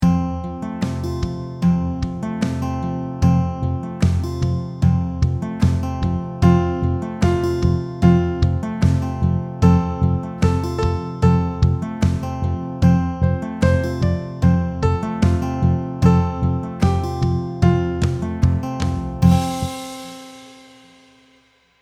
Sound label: slow tempo, ukulele; Rainbow Ukulele Play-Along